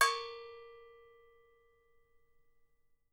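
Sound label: deep cow, right, Percussion; Miroslav Vitous - Percussion & Harp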